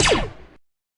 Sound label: blaster, guns fire; Weapons